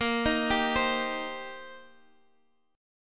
C Minor 7th, A sharp, chord

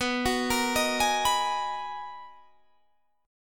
B Major 13th B, Guitar, Standard; BM13; arpeggiated; JGuitar

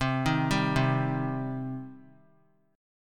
C Minor C down; arpeggiated; JGuitar

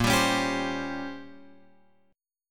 A sharp Minor 6th Add 9th A sharp, Guitar chord; A#m6add9 Chord {6 X 5 6 8 8} strummed

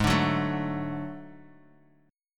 D Major Ab; D/Ab; strummed; JGuitar

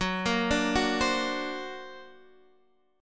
D Augmented 7th Gb, guitar

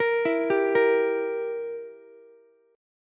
E Diminished E, Ukulele

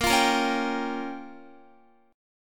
A sharp Minor 6th, Guitar; A#m6; strummed

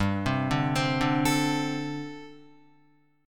G Suspended 2nd Suspended 4th, Guitar; Gsus2sus4; arpeggiated

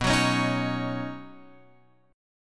B Major Add 9th B down Guitar Open F, Badd9 chord; strummed; JGuitar

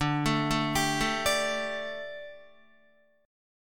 D Suspended 4th D down Guitar; arpeggiated

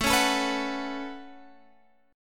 A 6th Add 9th A Guitar Standard, chord; strummed; capo 3; 17,16,19,16,19,x

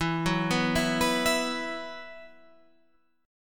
E Suspended 2nd, Guitar Half Step Down

